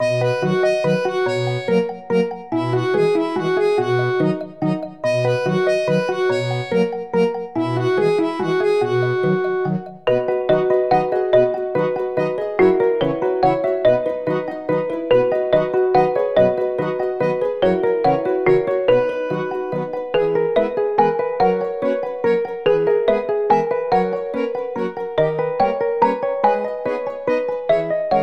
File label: Town Streets; peppa pig world adventures